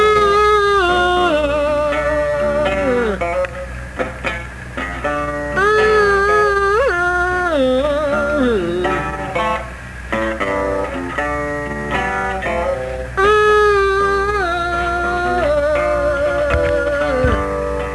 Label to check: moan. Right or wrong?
Right